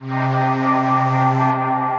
Ambient & Evolving; Ableton; Glitch and Wash

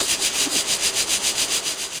Texture Machine, dark textures

